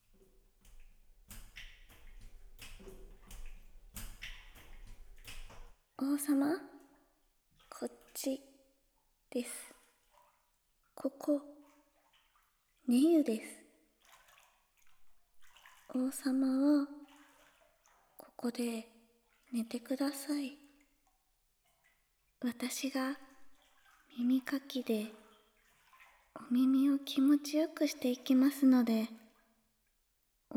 寝湯で耳かき（右耳）; ASMR

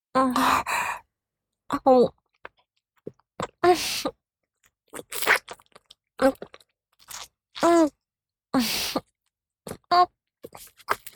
お掃除フェラ(中):亀頭を咥えて中に残っている精液を吸い出す; 実用ボイス抜粋集

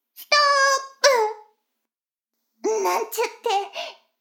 シコシコ音声, ASMR; 逆時間停止されて

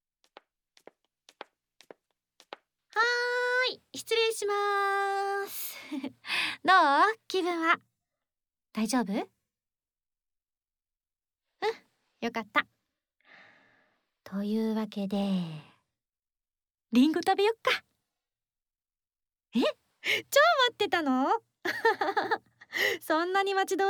リンゴ剥き・咀嚼音ASMR; はい、あーーーん♪

